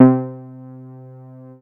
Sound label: MOOG BASS C4; Best Service Dream Experience